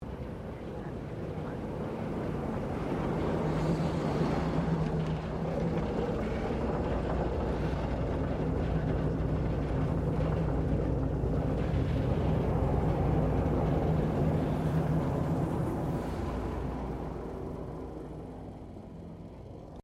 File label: Train Diesel Shunter VA1 Class 1947 Tasmanian Passing